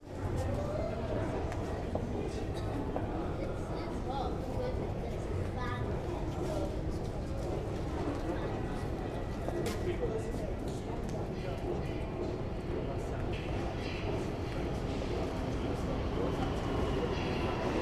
ambience london underground station trains arrive depart people chat